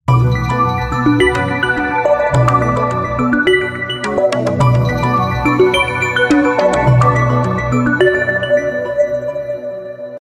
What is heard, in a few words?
oppo phone ringtone